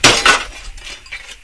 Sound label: bust metal; svencoop